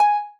marimba rainbow; ゆめ2っき

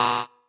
marimba bike; ゆめ2っき

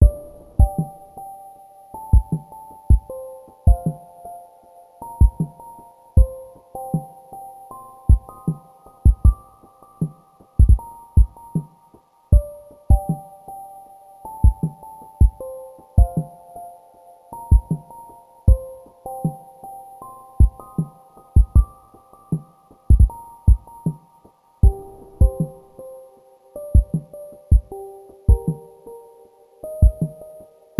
indoors distant; ゆめ2っき

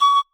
marimba child; ゆめ2っき

